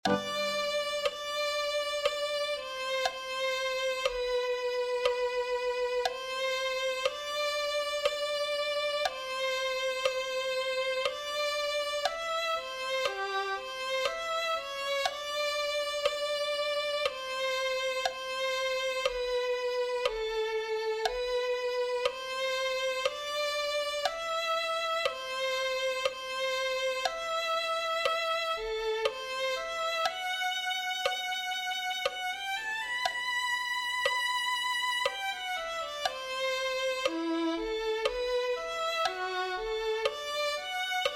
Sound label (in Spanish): lluvia abundante violin